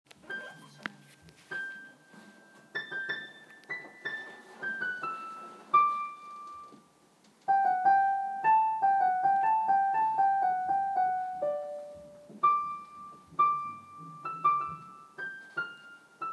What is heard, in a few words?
piano solo